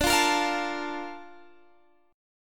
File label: A sharp Major 7th